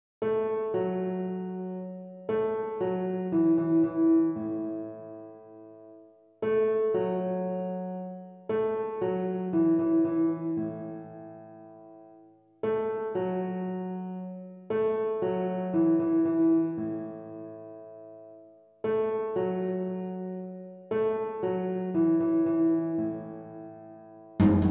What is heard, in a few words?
SATB - Accompaniment Track; Somagwaza